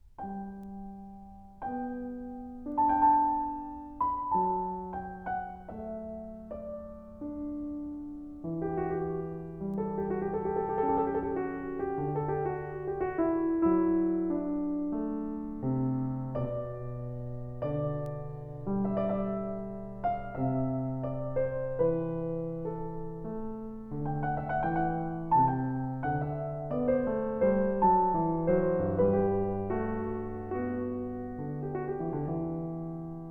aria

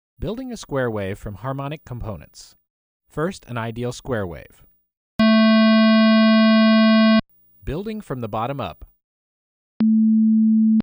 Harmonic Built Square Wave

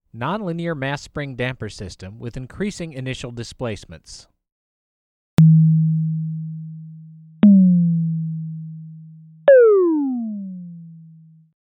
Nonlinear Mass Spring Model

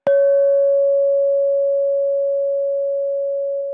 Glock N Baahhhht

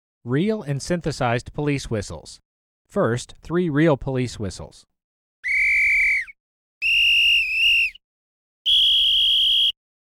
Police Whistles

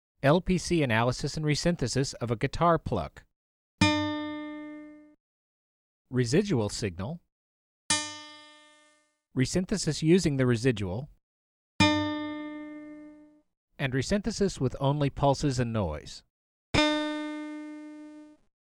Guitar Analysis Resynthesis; LPC

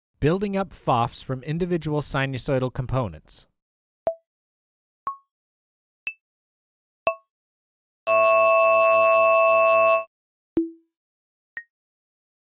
Vowels From Individual FOFs

